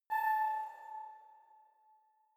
sci-fi facility ai awake; soundpool

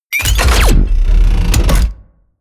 promo pad; music elements

